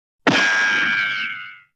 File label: ricochet; vanoss